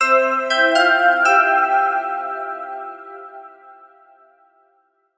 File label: multimedia musical branding signature logo synth bell chimes